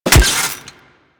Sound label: Melee Staff Impact Robotic Flesh; Warframe; Weapons; Staffs